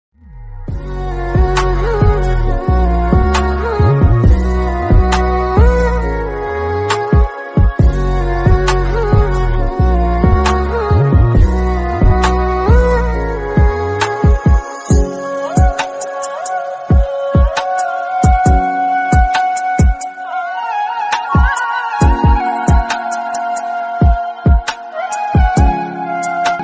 instrumental music bgm ringtone

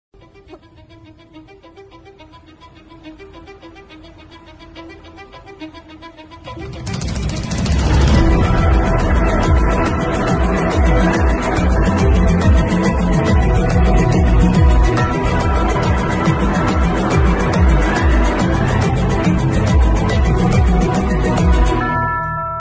family drama telugu movie title ringtone bgm

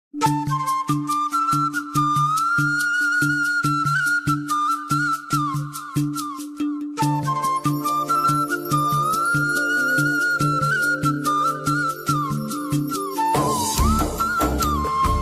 telugu flute ringtone movie bgm

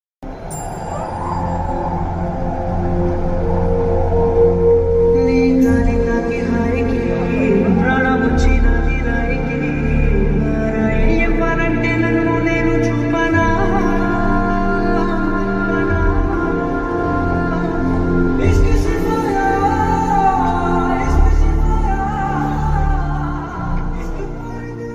bgm ringtone telugu ringtone; uppena; vaishnav tej; krithi shetty

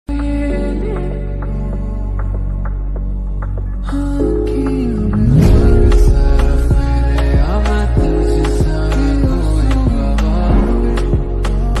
song ringtone punjabi ringtone punjabi song

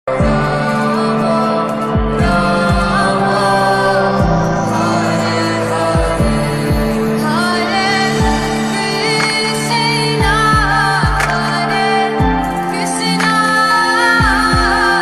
hare krishna hare rama ringtone lofi bhajan; spritual maha mantra

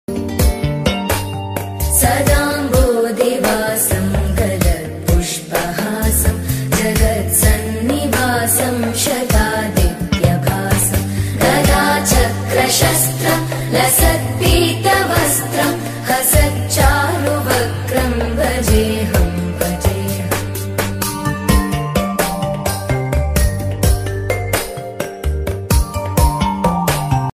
lord vishnu ringtone vishnu stotram ringtone; shree vishnu

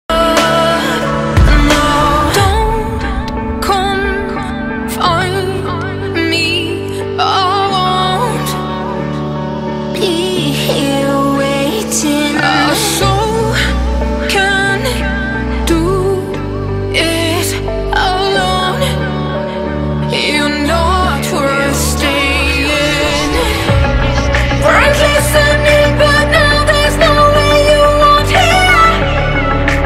english mobile ringtone

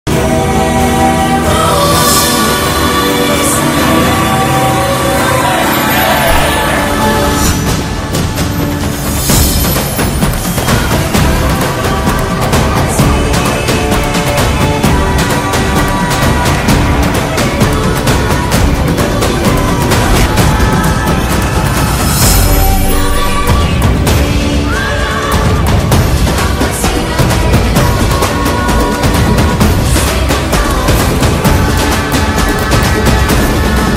theme music trailer bgm; mersal; atlee

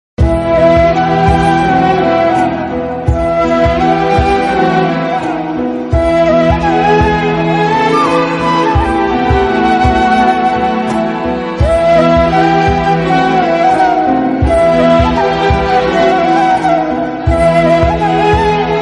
bgm ringtone; roja

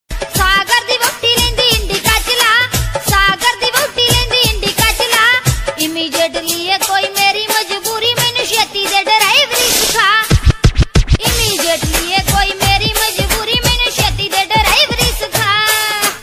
punjabi song

